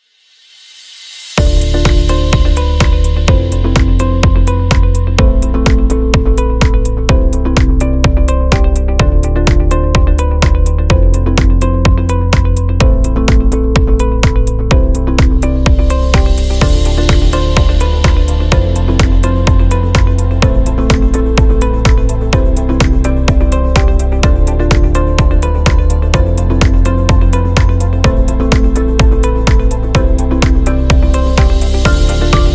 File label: Inspirational Corporate; fashion beat